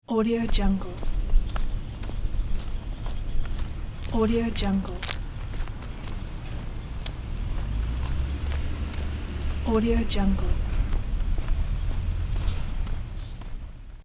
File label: walk on the street